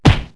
whack, impacts

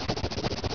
Wacky; Kid Pix Demo

